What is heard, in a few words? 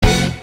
trumpets; Heathers Trumpets